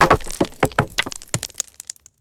rocks impacting and scattering on stone

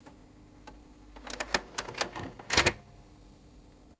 vintage computer disk drive ejecting; ui

